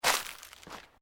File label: LEAVES DEAD MOVE, Rustling; Material components for constructing more complex sounds; Rustling (Sound Effects:Objects)